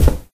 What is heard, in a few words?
Player Footstep; those nights at rachels gamerip 2015